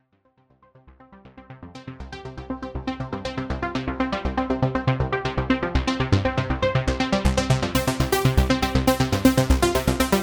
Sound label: arp